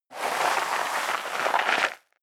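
step leather shoes gravel light slide long, footsteps; schritte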